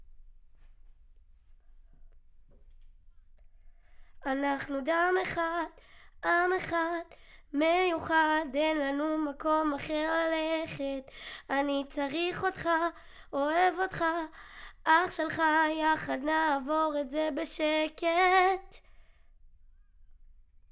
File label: Children Choir; Studio Bekol Children Choir